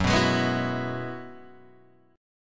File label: Gb 7th Suspended 2nd Suspended 4th Gb down Guitar Open G